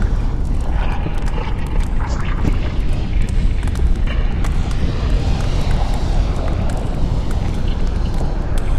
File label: lava, ambient